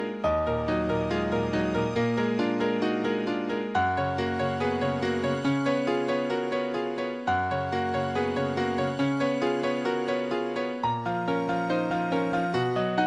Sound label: Nokia ringtone